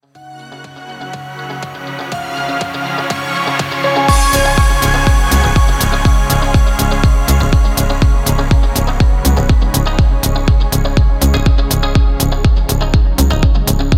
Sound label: kick subbass